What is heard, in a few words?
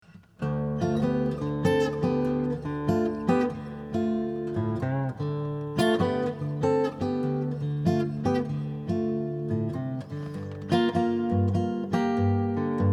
blues